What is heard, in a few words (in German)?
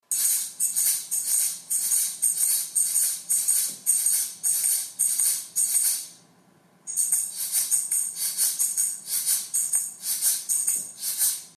Jingle Shaker